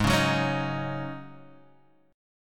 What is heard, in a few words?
G sharp 7th Suspended 4th G sharp, Guitar Half Step Down